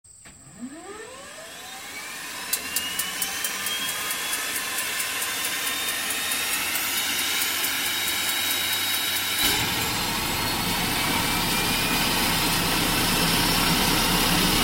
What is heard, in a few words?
Air tractor